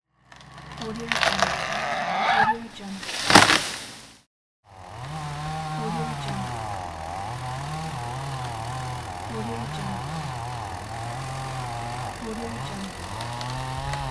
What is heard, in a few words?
big tree fall